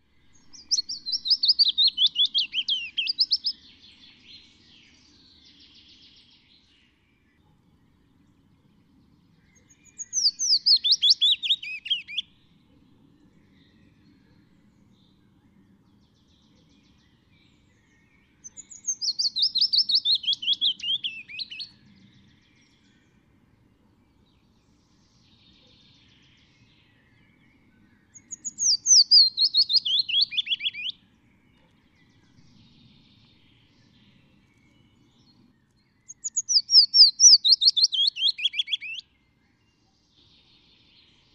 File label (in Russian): penochka vesnichka golos